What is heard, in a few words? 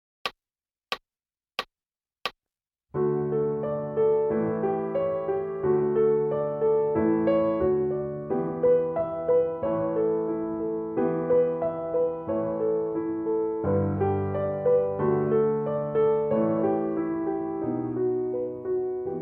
Piano